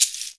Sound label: SHK2, SHAKER2; Univers Sons; Basicussions